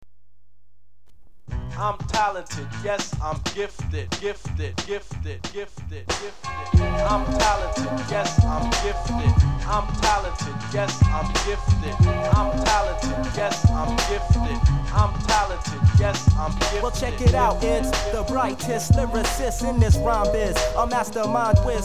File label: hiphop